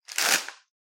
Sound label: tape pull; soundscrate